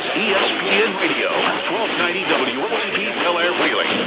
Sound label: womp